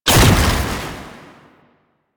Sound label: Shield Activate; Warframe; Nineteeen Ninety Nine; PvPvE